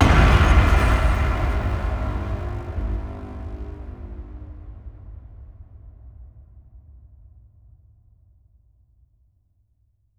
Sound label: Crunchy String, Cinematic Impacts; 99Sounds; Rumore